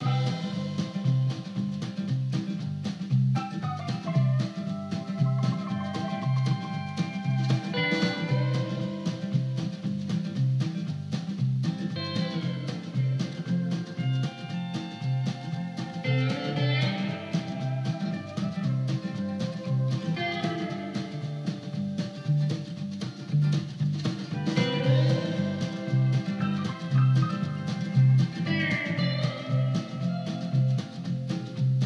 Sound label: tire carousel; lee woonderland